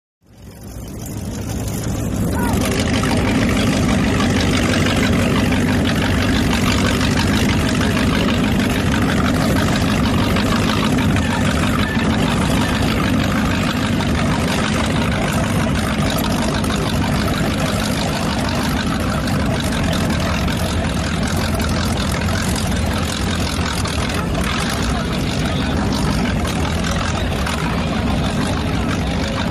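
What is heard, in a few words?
Power Boat Ambience, off shore power boat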